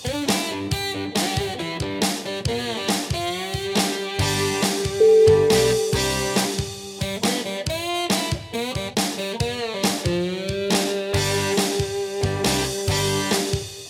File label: southern barefootin